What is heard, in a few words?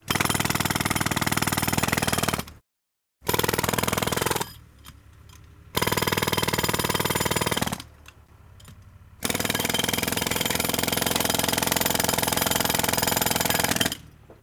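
jack hammer loop; ambience; props; loops; Warframe; Venus Open World; Venus Solaris United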